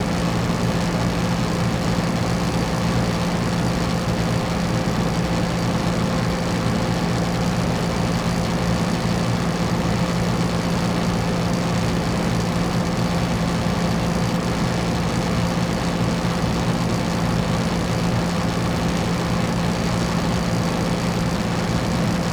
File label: FAN Air Conditioner, Hard Metal Grinding Noise, Hum, Buzz, LOOP; HVAC; Articulated Sounds; 100 Fans, HVAC, & Mechanical Drones